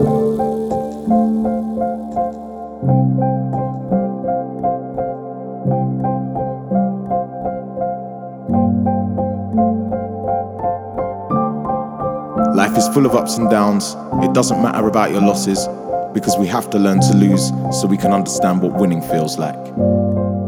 piano skit

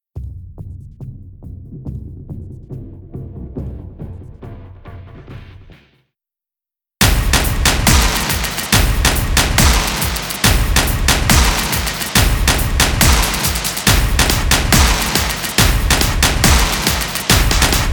percussion only

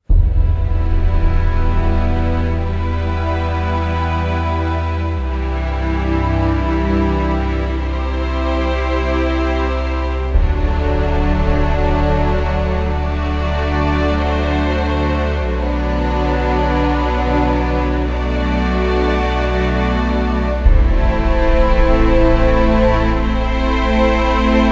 no choir

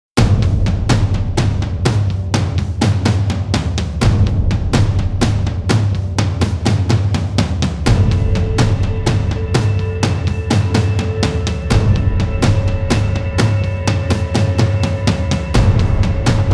drums fx

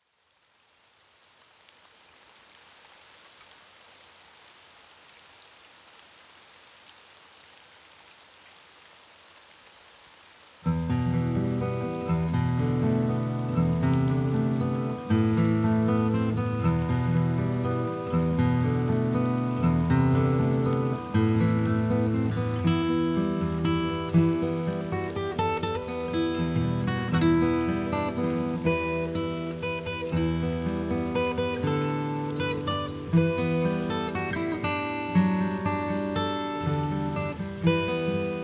mist covered mountains with quiet rain shower; for deep meditation

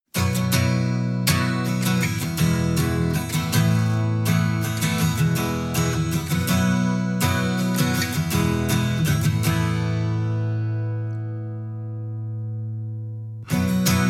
acoustic guitar only

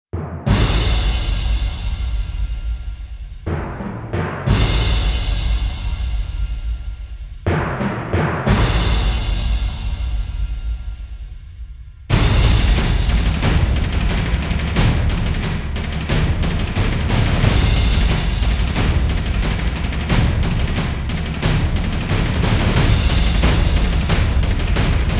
drums build

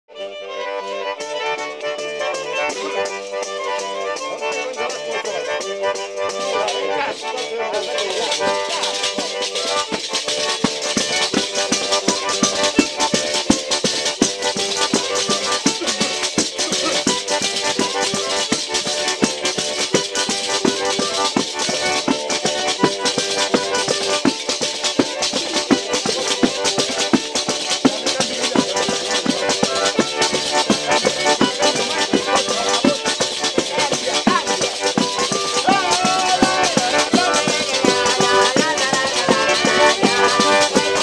tarantella instrumental

